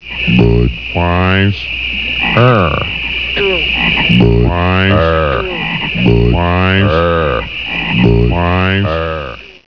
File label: bud frog; Ringtones